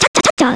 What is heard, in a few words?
chachachacha; GBA; MLSS; E.Gadd